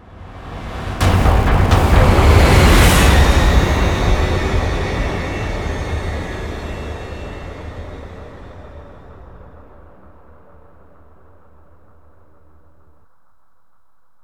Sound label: reveal stinger; music; stingers